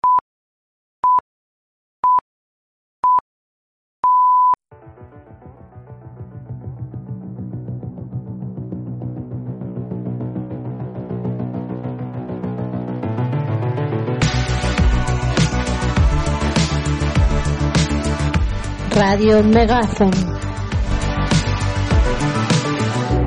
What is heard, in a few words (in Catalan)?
Logo radio; logo sonor